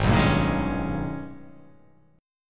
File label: Ab Minor Major 11th Ab; strummed; JGuitar